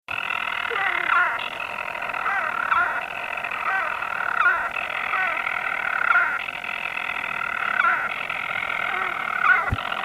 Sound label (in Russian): pryamohvostaya kachurka golos